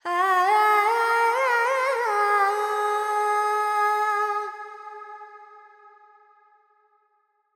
Pentatonic, Vocals, Carnatic Female, Alaps, G

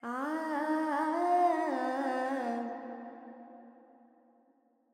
Pentatonic, Vocals Carnatic Female Alaps 70 G

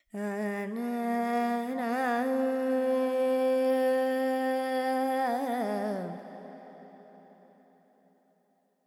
FX Lydian, Vocals, Carnatic Female, Alaps

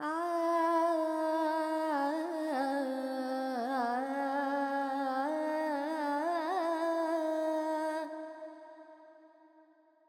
FX, Vocals, Carnatic Female, Alaps, Charukesi

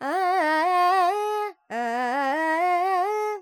Dorian, Vocals Carnatic Female Alaps 70 G Dry

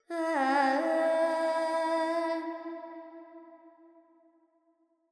Carnatic Female Alaps, Panthuvarali, 100 G, Verb

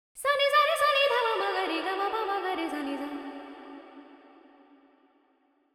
FX 4-4 D 100, Vocals Female; Sample Banks

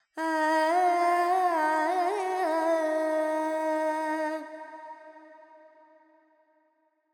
FX Phrygian, Carnatic Female Alaps; Sample Banks

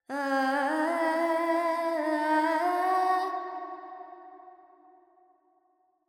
Verb Charukesi, Carnatic Female Alaps; Vocals; Sample Banks

